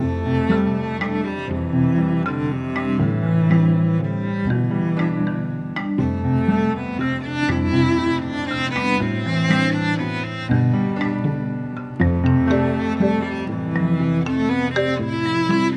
Music Level Crash Layer

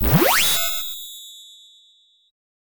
Coin; Fire Shark

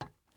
glass step

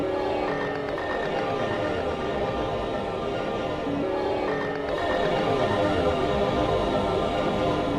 Ambient; Kyoto A; lsd dream emulator; ps1

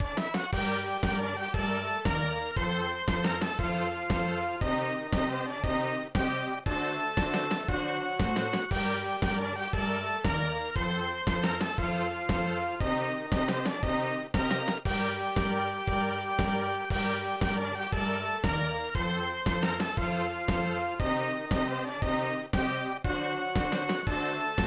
Start Menu; Konpeki no Kantai; SNES

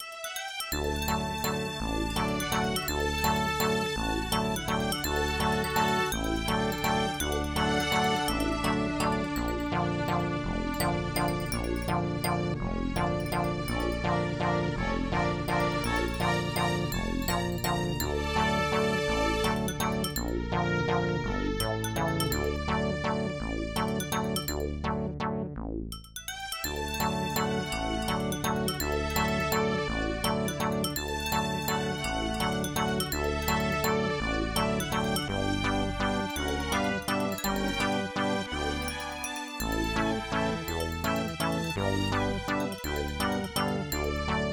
Waltz; Russian Pinball

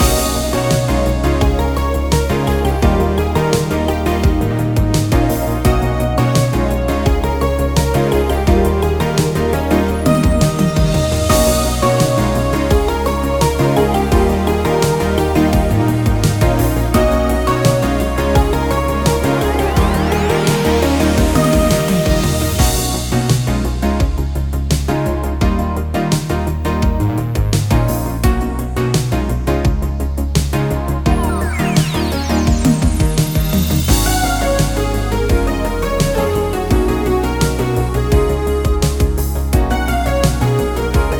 Menu Theme Loop; sky racket

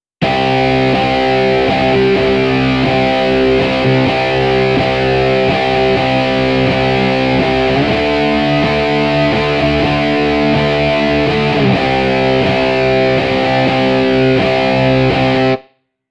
Guitar Dub; Dick Wilde 2

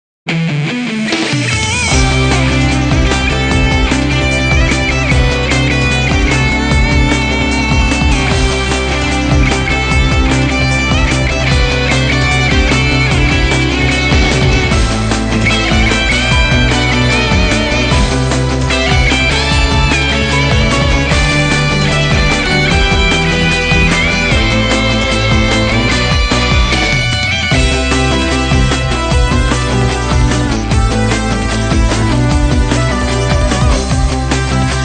Music Success event

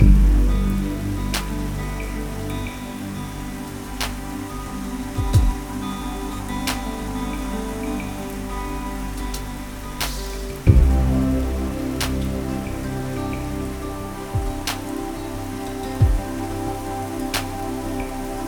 Loop; Mixolumia

